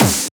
Snares; Vengeance - Essential Clubsounds Vol.4

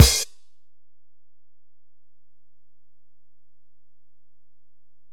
Ride, Alesis DM-PRO, Drum Machines

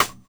Stk SP, Roland MV8800, DRM MV-1200

